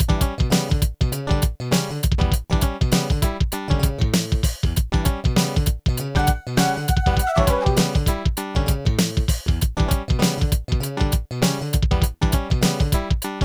human funk; Music-X

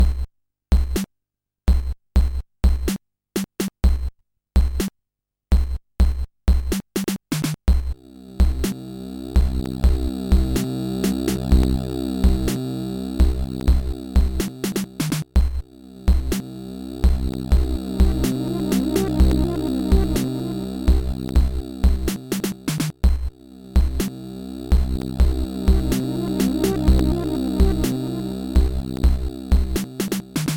load tune; Midas

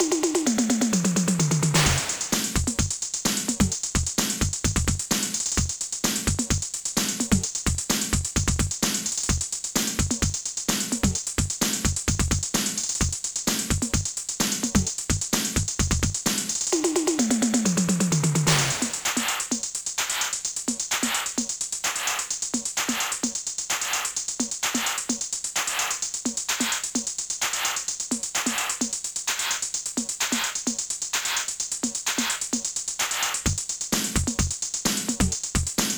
Techno, Dynamic Drums

